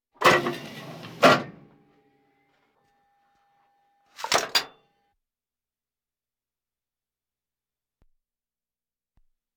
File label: Military vehicles